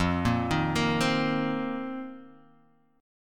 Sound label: F 7th Suspended 4th F down Guitar Open F; arpeggiated; JGuitar